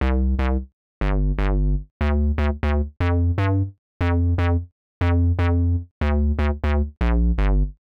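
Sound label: square bass C, Nu Jazz House, Bass Loops, 120 bpm; PowerFX - Nu Jazz House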